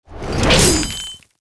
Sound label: Water atk